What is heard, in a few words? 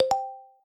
message